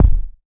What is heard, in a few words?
Yamaha TX-81Z BD; drum machines